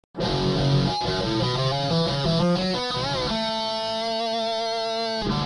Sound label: tapping